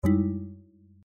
error

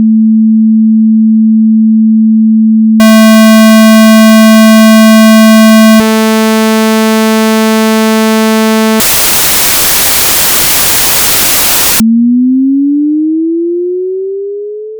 tone test

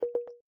voice notification off

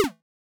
error

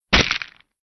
block explosion

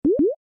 bip bop

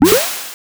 ally return

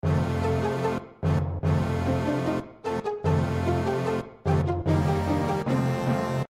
music loop

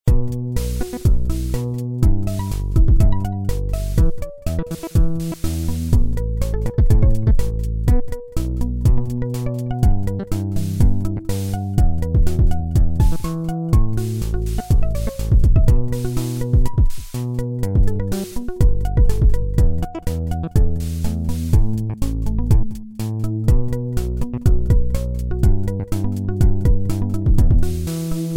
bgm

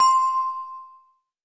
chat new

